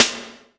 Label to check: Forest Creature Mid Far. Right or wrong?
Wrong